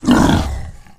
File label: boar attack; STALKER; customnpcs